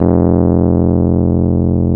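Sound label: E PNO F0; Synclavier Sampler Library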